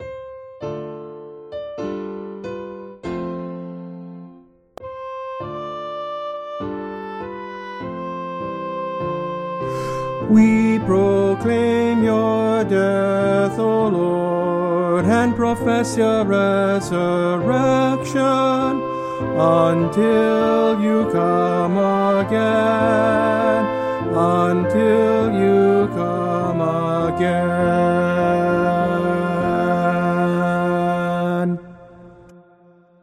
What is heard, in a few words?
Community Alto